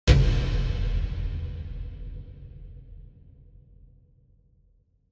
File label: sound design hit cinematic big dark